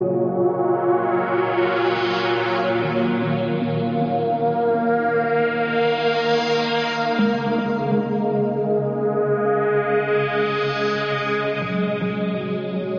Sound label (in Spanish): Suspense